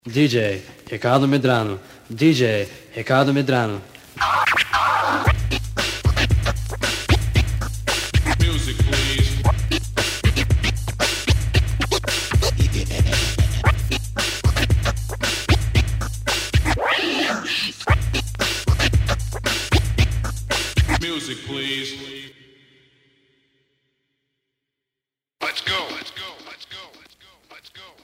scratchapella

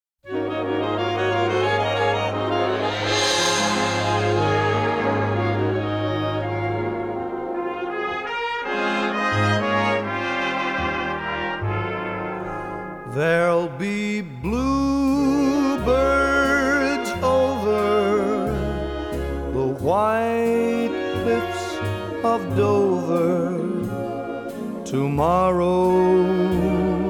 romantic strings orchestra

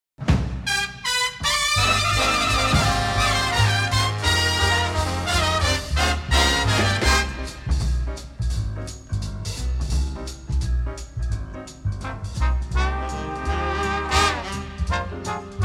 trombone and his orchestra

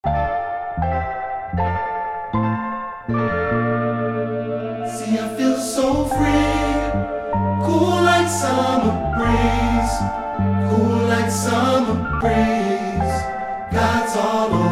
choir

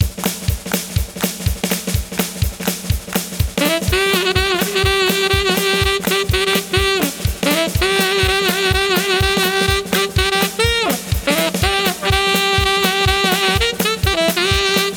saxophone, honkin and screamin

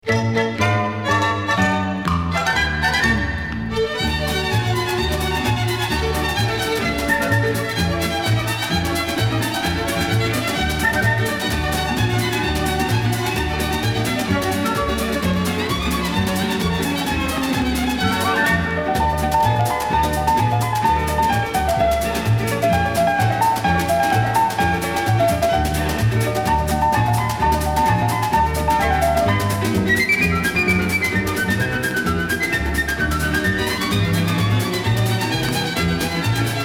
samba; Germany

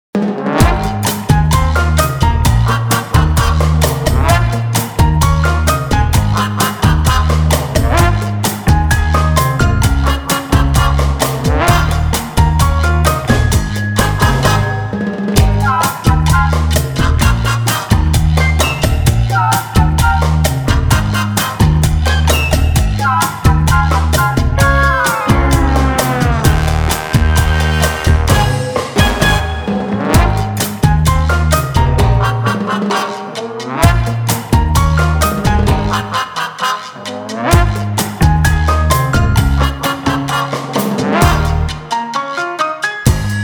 cha cha

